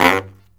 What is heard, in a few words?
Killer Brass Riffs